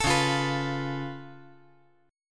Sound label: C sharp Augmented 7th, C sharp, down; C#+7; strummed; JGuitar